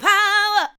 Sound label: ADLIBS 90; Ueberschall; Jam Box